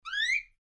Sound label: cockatiel